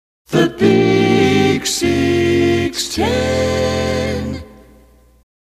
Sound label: Acap Slow, Jingles; KFRC